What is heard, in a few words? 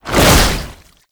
Underground Attack Swing; Warframe; Abilities